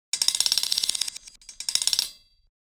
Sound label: metal texture rattling